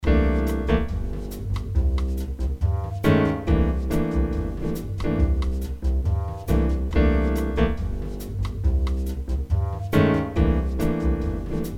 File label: Dominant 7